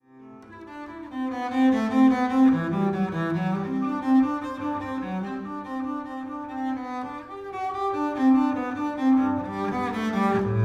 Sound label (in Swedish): Preludium duo